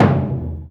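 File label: loud drum, Burundi; Heart of Africa; Spectrasonics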